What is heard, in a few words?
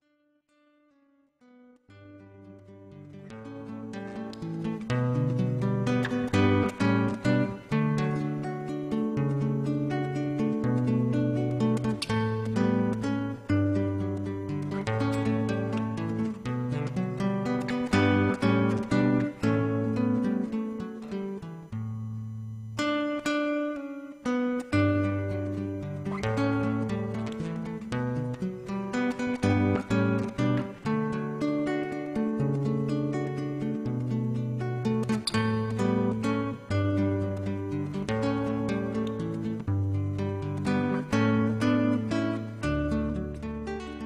solo guitar; Swing